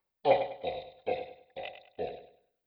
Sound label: haha